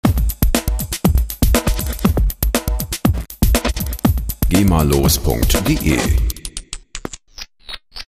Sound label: drumloop